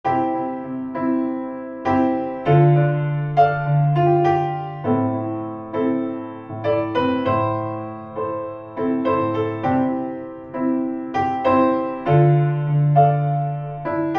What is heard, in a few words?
Piano Room